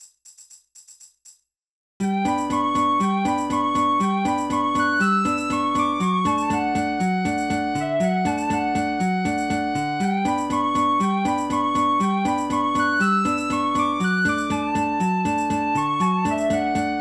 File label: Tin Whistle; LoZ